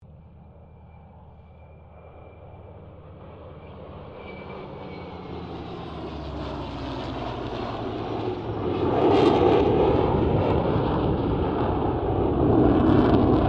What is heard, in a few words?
AIRCRAFT SABRE METERO MUSTANG KITTYHAWK HORNET PASS; Mixed aircraft squadrons; Airplanes (Sound Effects:Vehicles)